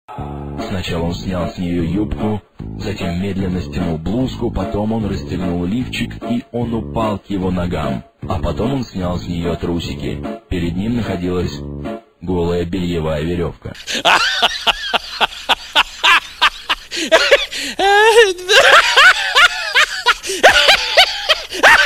Заразительный смех

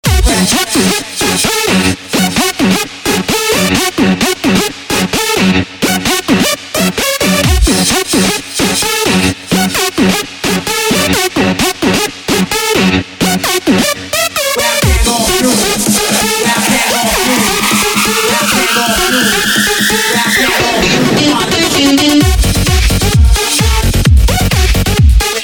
Клубняк

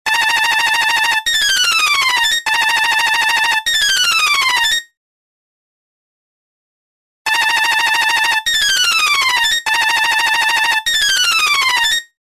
Классный звонок

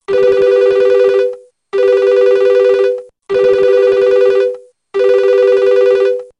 Звоночек

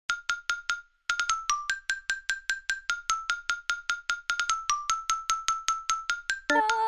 Ксилофон на смс

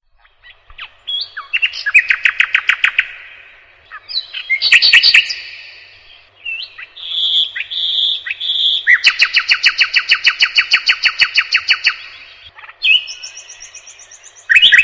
Птицы

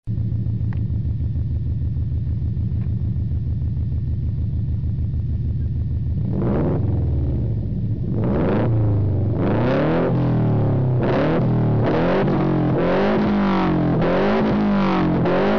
Звук двигателя Chevrolet Camaro

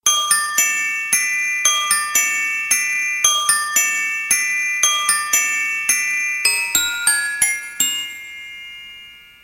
Звоночек

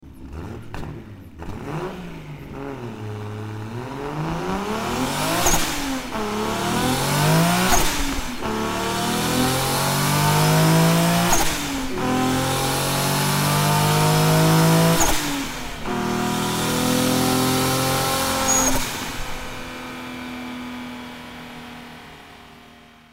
Звук двигателя Nissan Skyline

